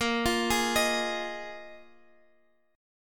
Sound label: Bb 7th Suspended 4th Bb 4G,5C,5E,5A; 15,17,16,18